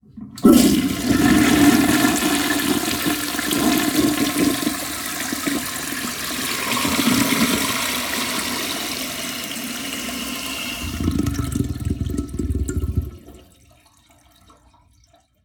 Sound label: iPhone Stereo Toilet